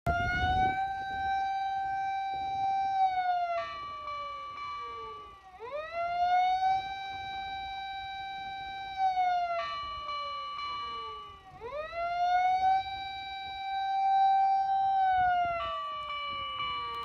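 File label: siren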